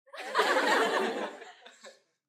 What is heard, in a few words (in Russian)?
iz tolpi kto to posmeyalsya